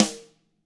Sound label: TAMA SNRD, TAM RM; ILIO; Double Platinum Drums 1